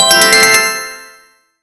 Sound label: slot machine